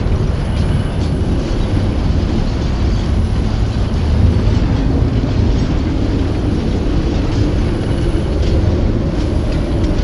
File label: scavenger bot work loop; Stellaris; distant stars